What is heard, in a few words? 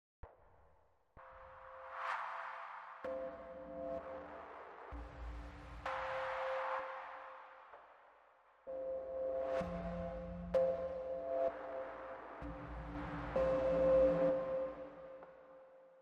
Only Synths